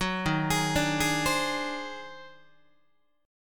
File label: Eb Minor Major 13th, Guitar; EbmM13; arpeggiated; JGuitar